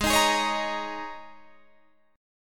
G sharp Suspended 2nd Suspended 4th G sharp Guitar Standard; strummed; 16,18,18,18,16,18